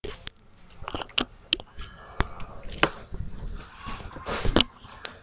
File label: horse